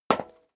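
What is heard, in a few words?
step leather shoes metal dry single left, footsteps; schritte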